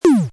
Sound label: pow